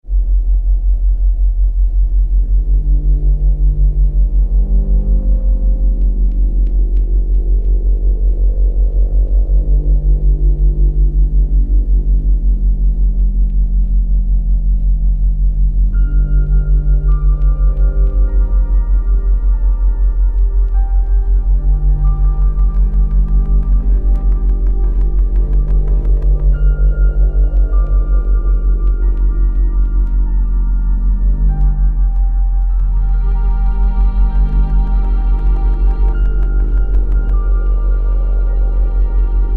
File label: Ambience